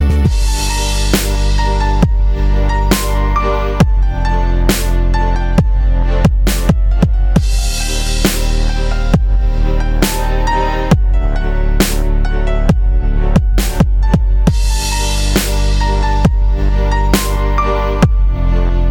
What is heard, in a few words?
dubstep remix; bumer